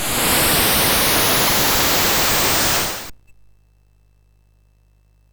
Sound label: Project Firestart, MOS6581R4